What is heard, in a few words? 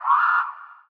Ghost Damage